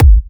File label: Bassdrum